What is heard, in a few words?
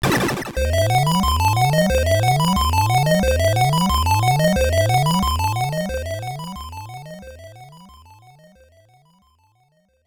SFX Staff Appears; Dragon Fighter; NES